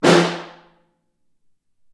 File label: Far, Snare